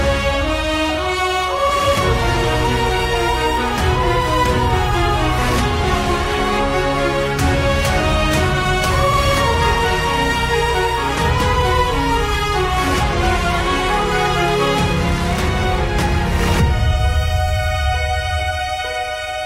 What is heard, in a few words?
ORCHESTRAL